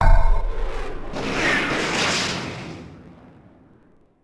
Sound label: Build Stealth Bomber; Civ4 warlords